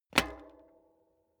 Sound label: bicycle street foot pedal hard